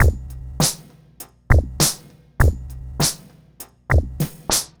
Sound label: Remixed Drums 100BPM; The Roots Drumloops